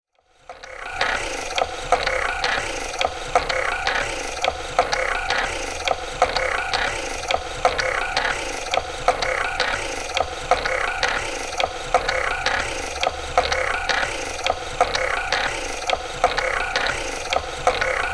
Swing

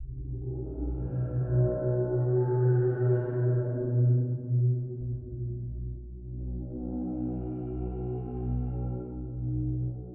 Synth Sound

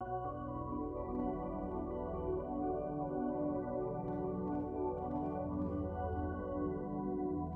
Soft Pad

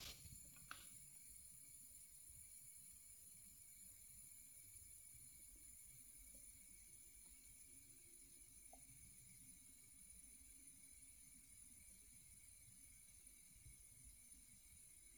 Hour Glass Sand Falling

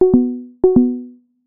Low Battery

